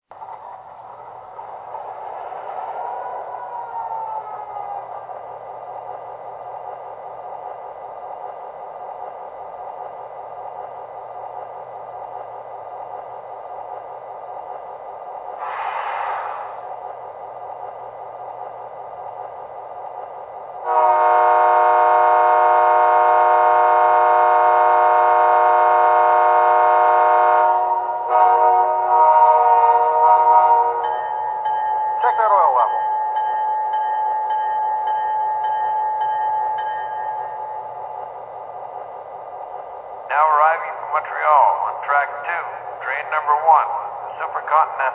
F7, electric trains; CNT

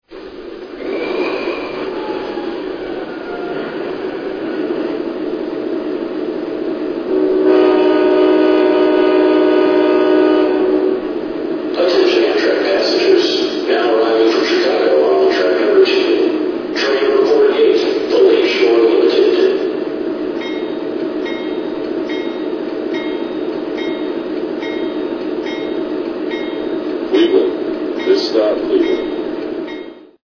Genesis, electric trains

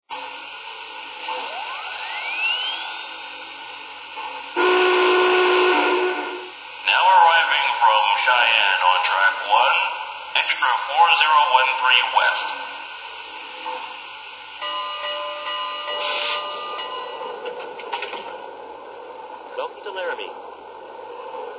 bigby pas up, electric trains

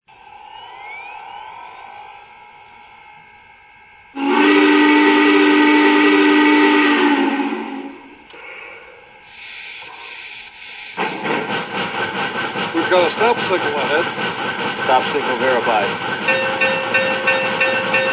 Challngr COAL, electric trains

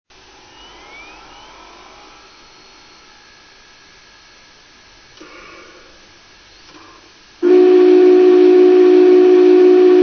BIG BOY; CHEY

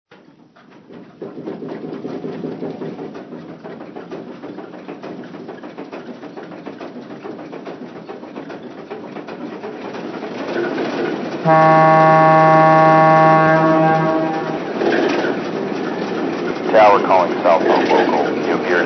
Alco S-2

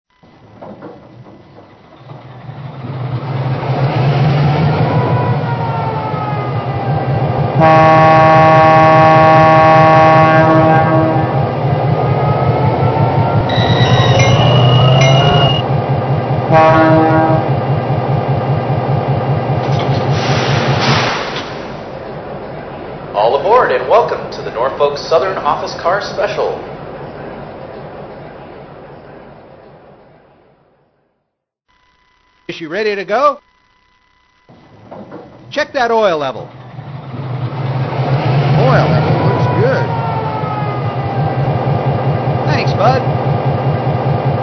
EMD F3

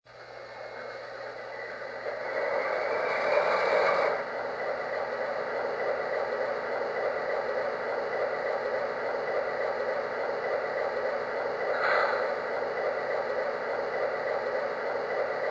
DASH 8, electric trains

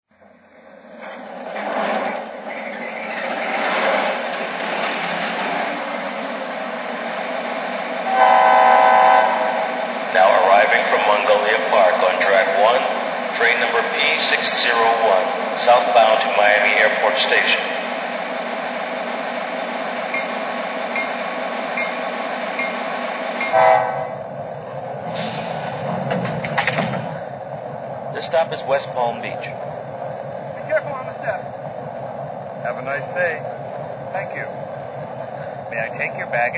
f40ph

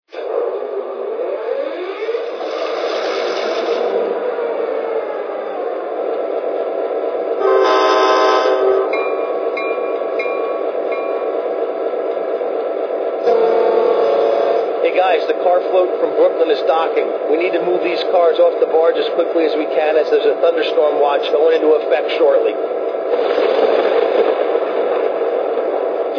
MP15, electric trains

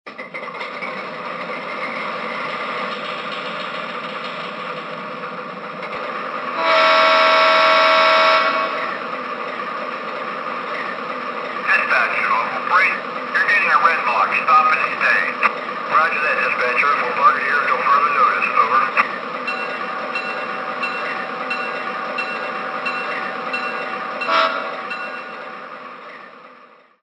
c628, electric trains

